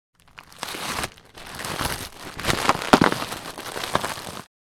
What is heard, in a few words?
Plastic bag crushing; gfxsounds